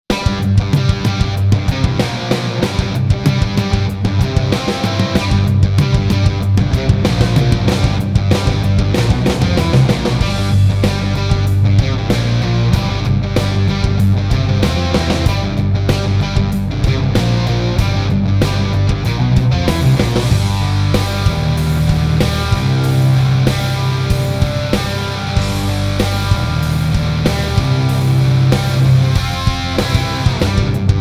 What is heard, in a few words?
Rock; pippa funnell the golden stirrup challenge windows gamerip 2006